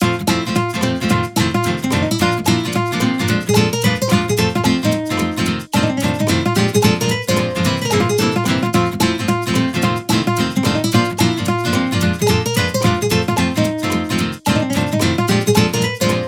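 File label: BGM Toy Puzzle Result Draw; clubhouse games 51 worldwide classics 2020 switch gamerip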